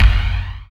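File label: bass drum, bass drum dance; Sound & Vision - Gigapack I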